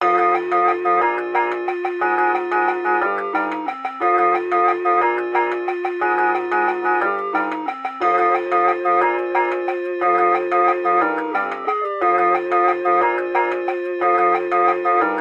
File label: elevator, ambient music; Mirror's Edge (2008)